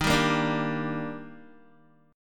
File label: D sharp Major 7th, Guitar; strummed; JGuitar